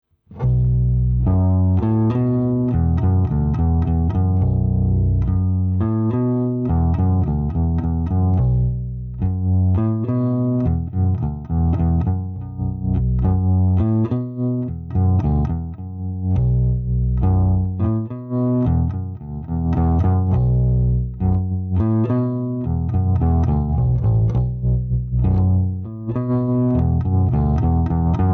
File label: bass clean